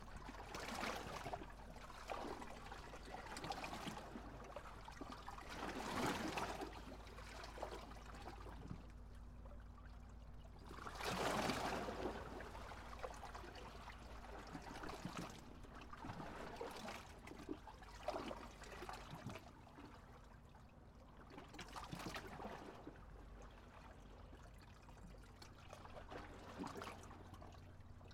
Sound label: Sea Waves Rocks